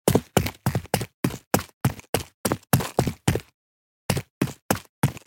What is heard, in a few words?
Footsteps on street shoes run